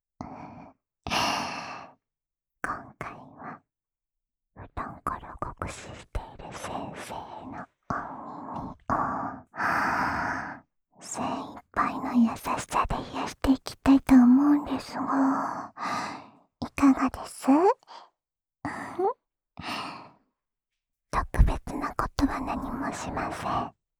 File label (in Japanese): 安眠用素肌マッサージループ（左耳）